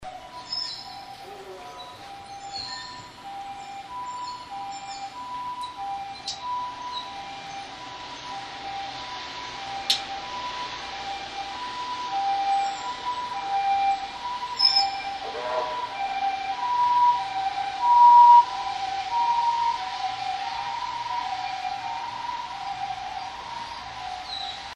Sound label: bath siren